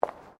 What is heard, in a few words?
FOOTSTEPS WOMENS LOW HEELS PAVERS STEP; Footstep Foley; Human